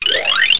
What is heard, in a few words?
xylaphone gliss up loud